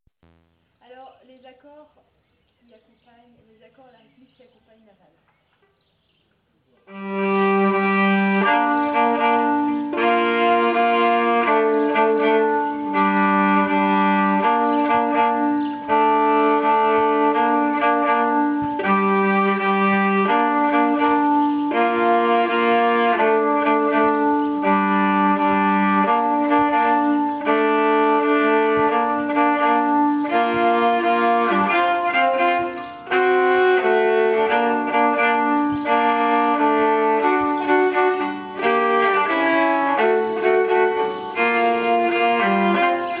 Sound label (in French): fin accords, nyckelharpa